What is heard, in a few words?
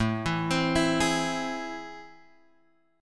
A Minor Major 7th, down, Guitar, Drop D